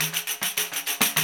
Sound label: TAMBO, Tambourines